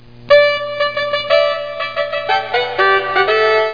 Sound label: FANFARE; WP6DEMO